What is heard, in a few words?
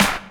SD, HIP, Dance Mega Drums